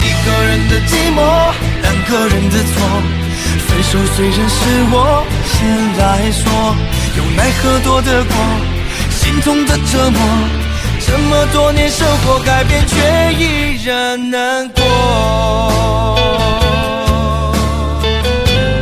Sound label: chinese song